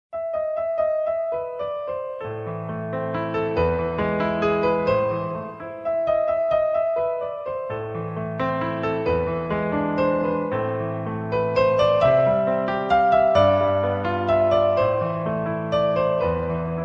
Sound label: apple new ringtone